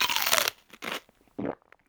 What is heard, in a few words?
eat apple